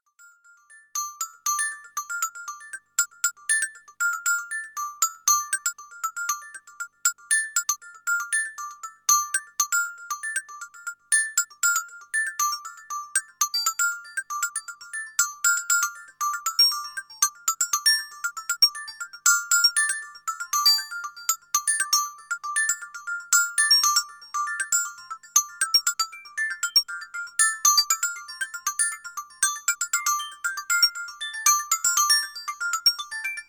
s3 rhythm

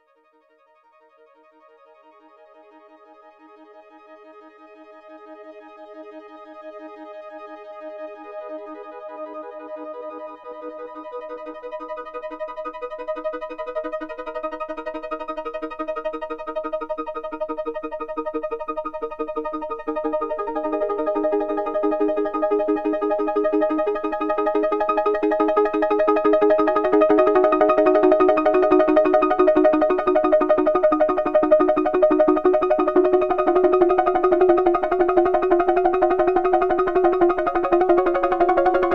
Oberheim OB8 Arpeggio

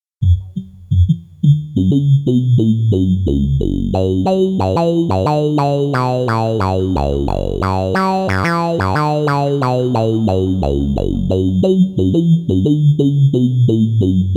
Axxe Vocal sound

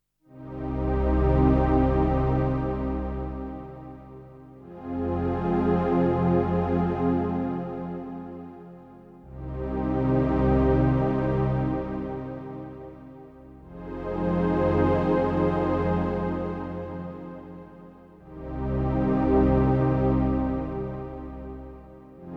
Roland System8

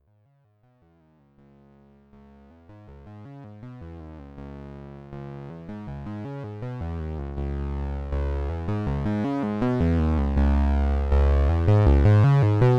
Polymath Notch

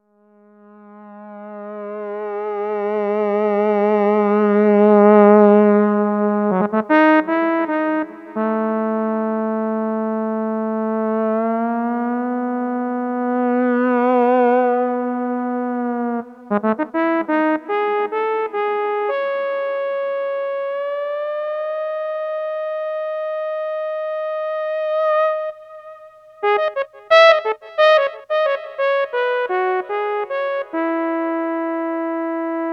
k3 sax

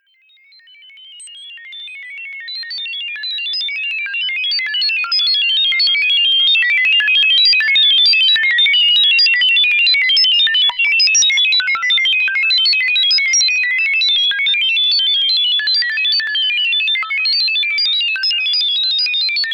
Sample Hold; GRP A1

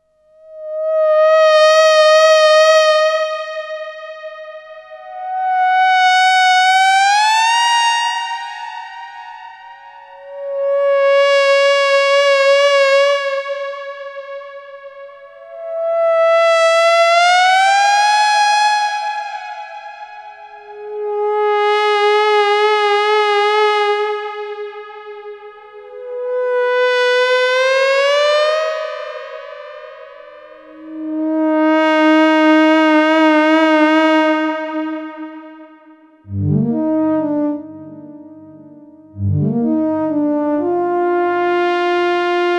sixtrak solo lines

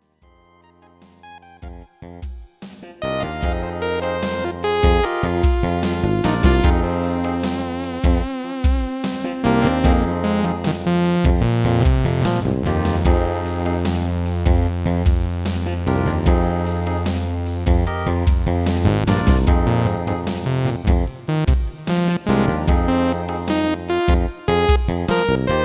s3 hohner ADAM

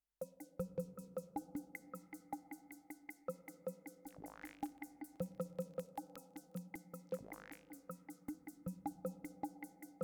Maestro on C 4 Timbres bip, synthesizer

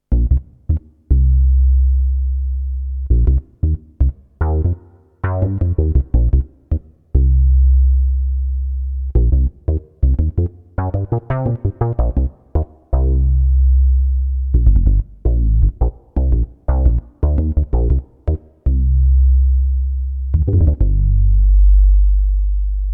jx8p synth Bass

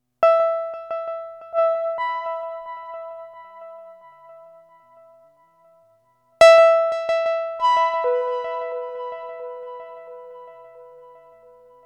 Sweet Octaves

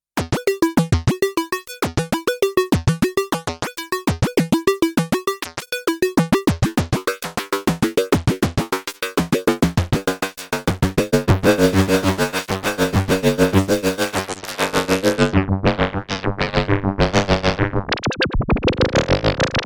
arp; TRANSFORMERS

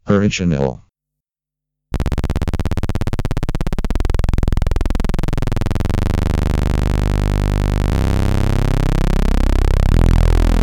MS20 Original Mini Legacy Saw Unison

